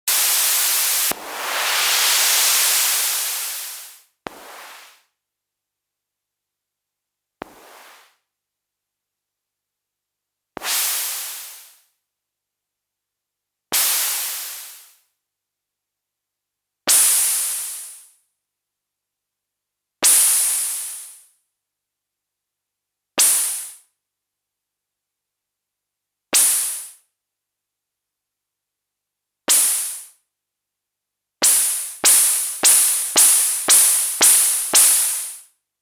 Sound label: Noises clockwork triggered